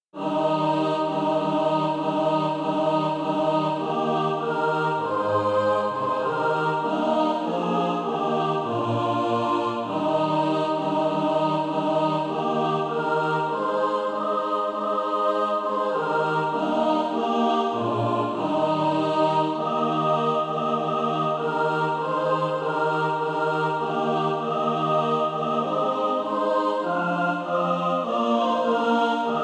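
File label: mixed, SATB Practise Music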